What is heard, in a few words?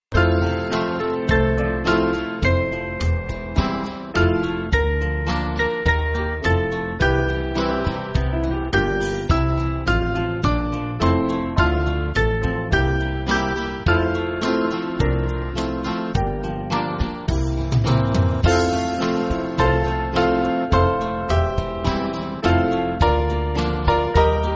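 Band, Free Music Backing Files for Churches; Peace Perfect Peace; F-Gb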